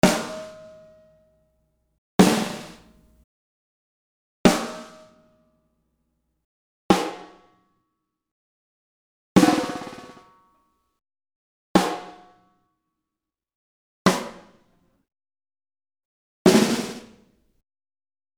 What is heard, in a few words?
Snares 76 Drum Slam